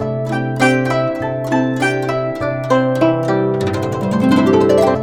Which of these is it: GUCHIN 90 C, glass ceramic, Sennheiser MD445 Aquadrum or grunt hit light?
GUCHIN 90 C